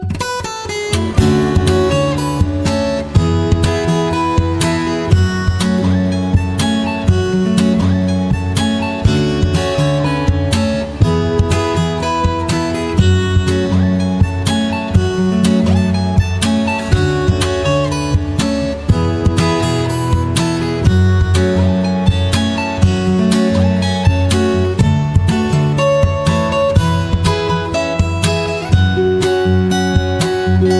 Rington

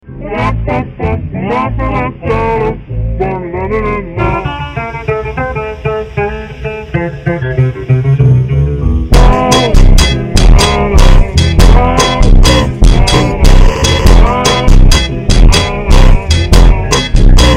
FUNK Ultra Slowed

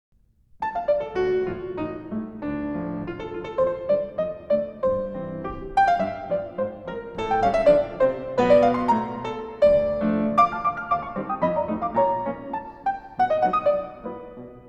Piano

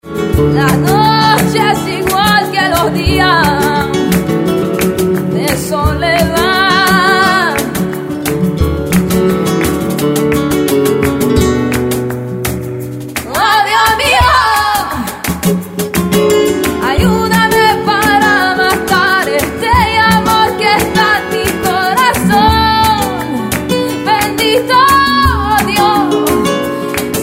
Rington

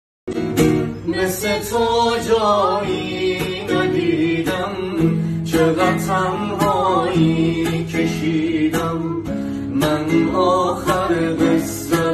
Gitar

